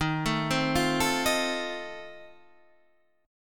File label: F 7th D sharp